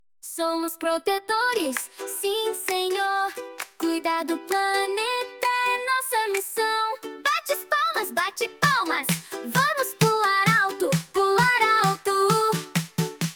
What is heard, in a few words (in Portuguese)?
Musica meio ambiente